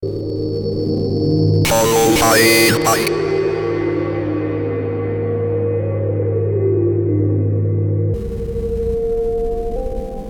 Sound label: Noises, Techno; Netlabel Day 2023 Techno Warriors